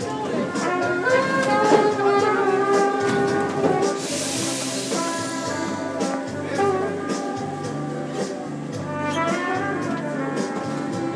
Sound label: live brass; paris